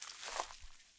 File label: Rustly Step, Footsteps, outdoor